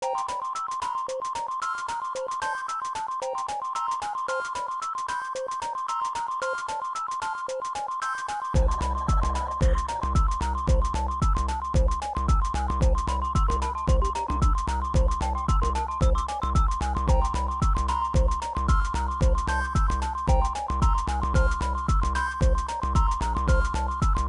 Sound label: Cosmo Field Ambience; solvalou namco system 21 arcade